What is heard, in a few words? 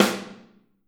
Snare OH; Snare OH All; Drums Percussion